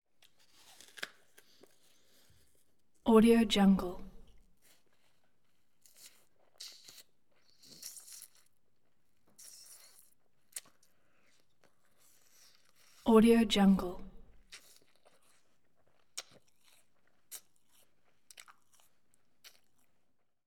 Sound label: eating apple